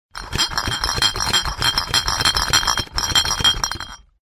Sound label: Six Pack Rattle